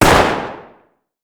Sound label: battle fire, battle rifle